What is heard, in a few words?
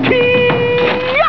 Hee-ya